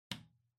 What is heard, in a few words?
Light Switch-Box-Rocker (Wall-Gentle)